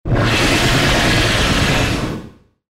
モンスターの鳴き声

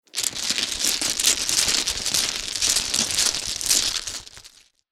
麻雀牌をまぜる; board game casino mahjong

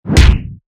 斧 空振り; モノラル; 武器効果音

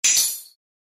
スイッチ; ＲＰＧゲームのダンジョンやフィールド

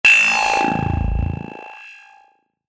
機械起動; モノラル

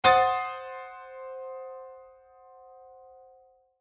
ガーン; 場面切り替えや心理描写; 演出効果音

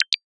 メッセージ

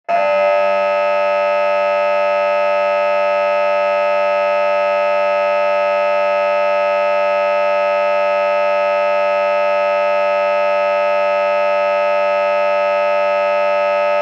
劇場やホールの開演ブザー 30秒 リバーブ有り; 「演劇・舞台」の効果音

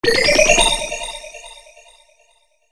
ポーション

